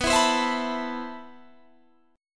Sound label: C Major 13th B